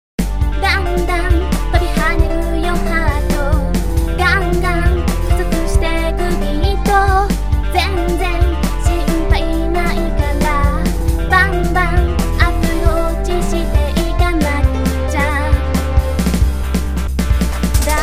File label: run